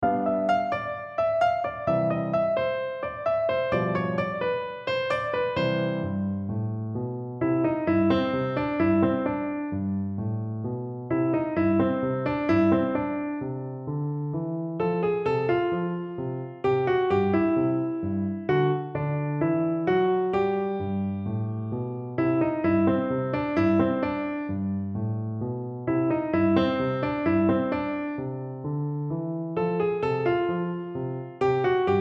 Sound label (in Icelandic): píanó ragtime; piano leikur 3 hefti